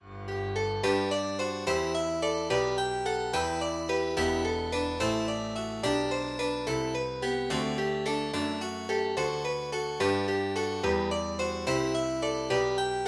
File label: Midi